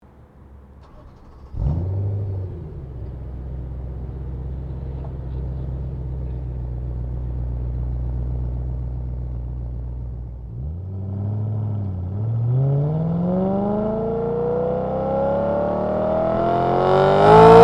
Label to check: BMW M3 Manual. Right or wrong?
Right